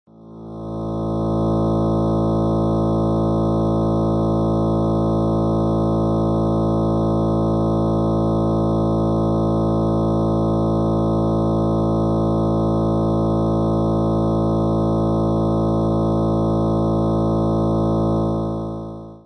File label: double bass A 3rd